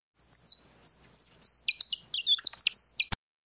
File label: Track, Doors; 헐리웃특수효과음; 문 소리